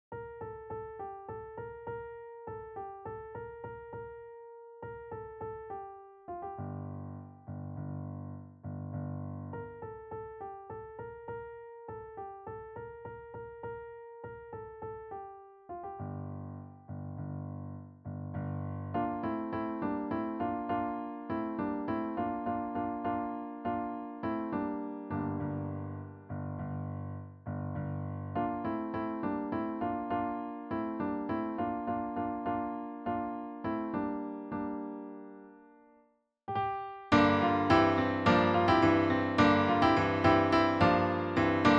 piano

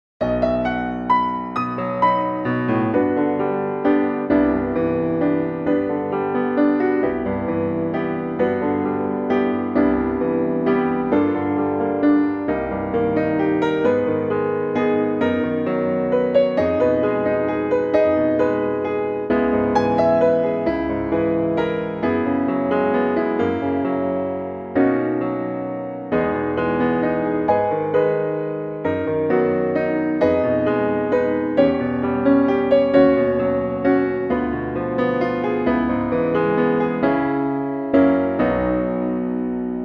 Accompaniment Only

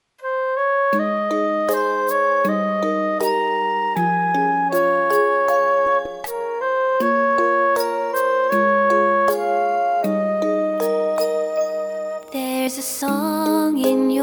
with vocal

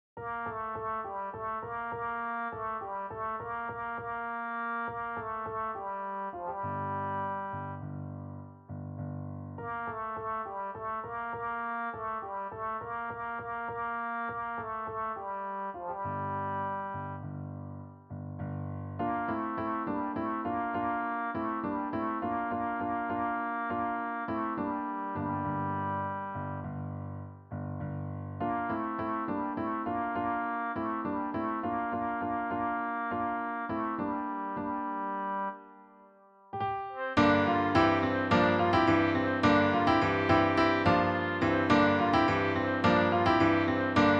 piano melody

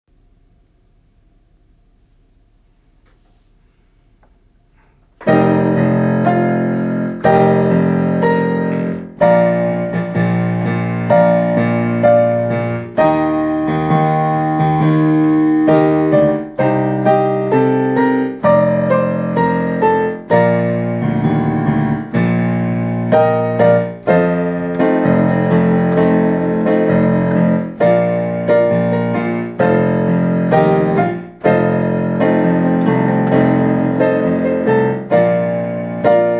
Accomp

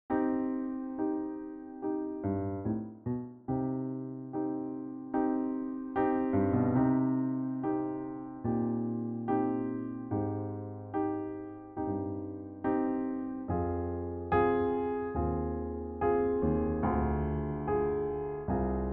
PIANO

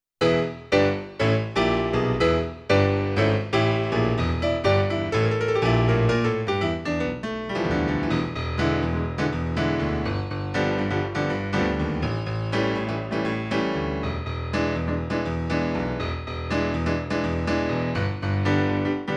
accompaniment

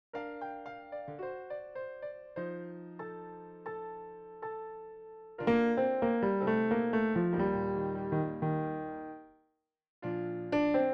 Plunk Track

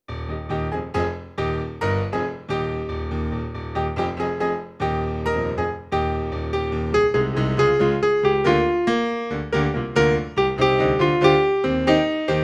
melody

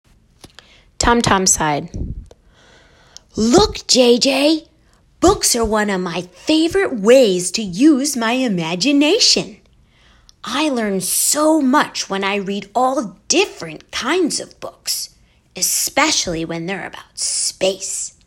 Tom Tom side

